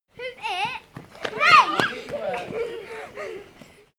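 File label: kids playing laughing scream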